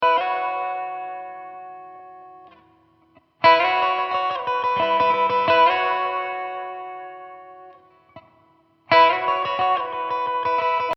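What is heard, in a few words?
guitar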